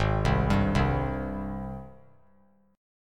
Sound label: Ab Major 7th Ab down 3C 3F 3A 3D; AbM7 arpeggiated; JGuitar